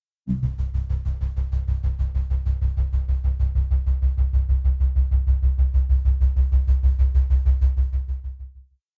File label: Pulse Bass Trem, tremolo